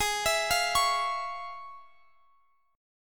F Minor Major 7th Sharp 5th, Ab

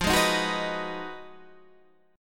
B 6th F, guitar chord; B6/F; strummed

